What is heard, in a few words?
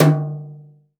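brush hard; Northstar - New Gold VOL-2; 30S BRUSH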